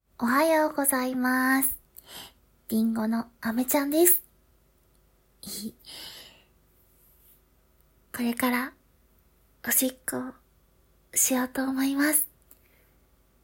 お風呂で立ったままおしっこ

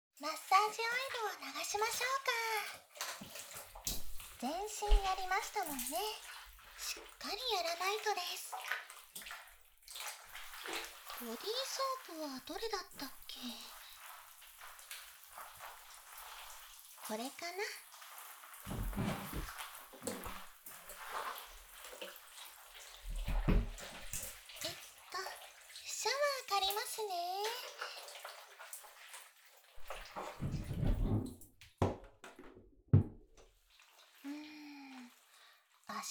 体を洗う

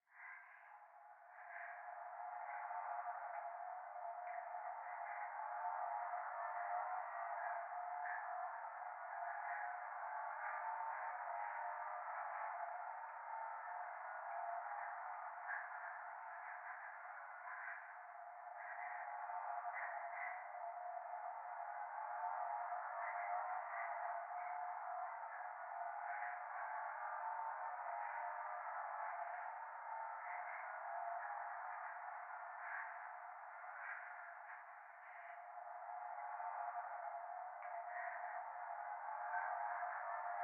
教会 右耳快感吐息 車の中

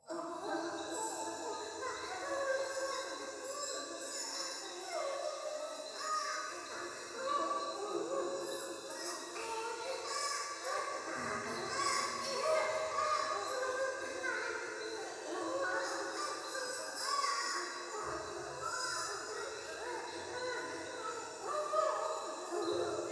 風呂 低音喘ぎ 山奥夜, ASMR

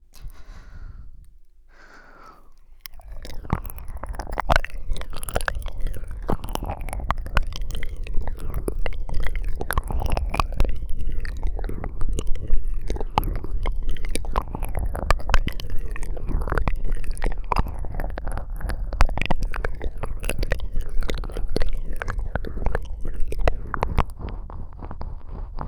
右脳舐めコース

